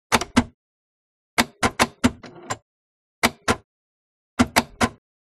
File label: Phone Clicking